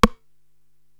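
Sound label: snare drum Rimshot, Drum Machines, Korg TR-Rack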